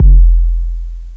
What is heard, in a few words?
KICK L; Big Beat Samples & More; AKAI